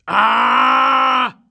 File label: AUNGH